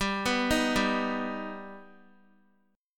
G Augmented G Guitar; arpeggiated

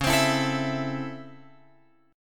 C sharp Minor Major 7th Sharp 5th C sharp, chord

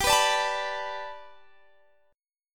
G Major Add 9th Guitar Drop D, chord

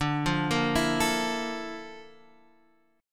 A sharp Major Sharp 11th, D

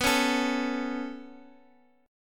B 7th Suspended 2nd, Guitar, Open D

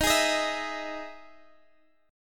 E Major 7th D sharp, chord

